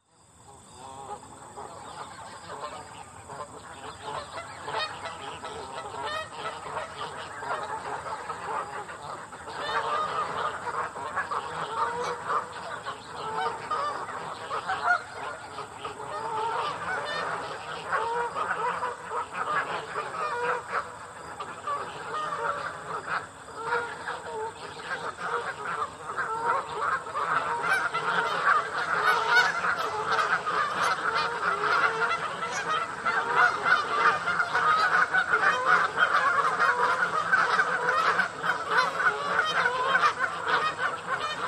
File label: Geese And Ducks In Pond, Medium Distant Perspective; Very Active.; Forest